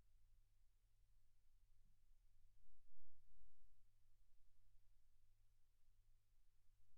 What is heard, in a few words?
silence 48kHz